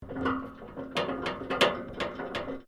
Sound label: MECHANICAL SUSPENSION METAL SPRING WOBBLE SHORT; Variou smechanical sounds; Objects